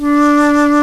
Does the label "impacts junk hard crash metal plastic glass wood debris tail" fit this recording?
No